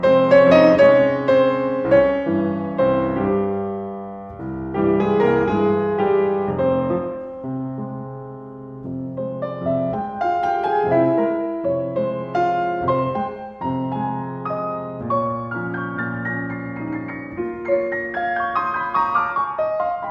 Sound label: Tango